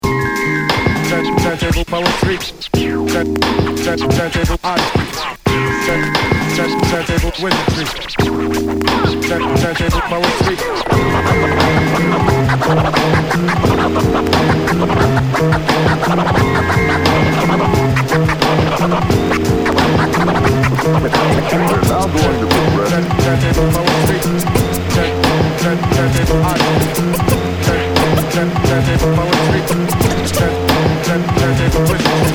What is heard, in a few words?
turntable poetry